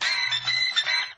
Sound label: keys, phonky loops